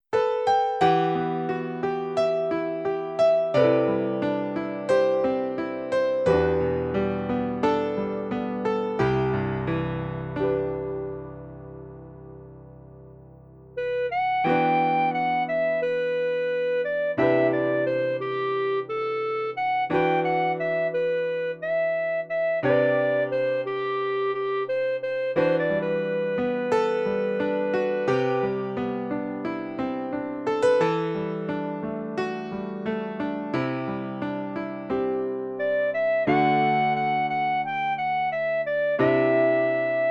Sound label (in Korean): Piano 멜로디MR